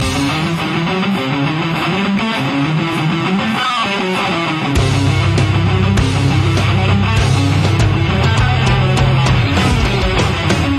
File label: Rock; God Of War